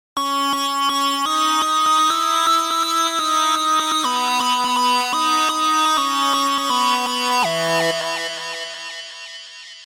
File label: Synth Lead